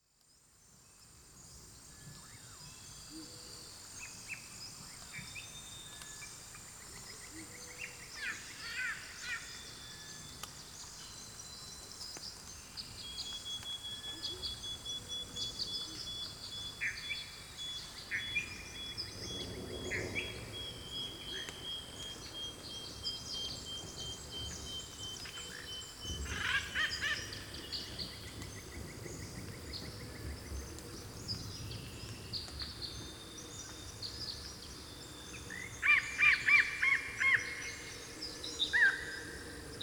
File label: Beautiful Rainforest